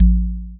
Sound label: cinematic hit